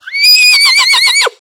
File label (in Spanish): relincho; Mediepals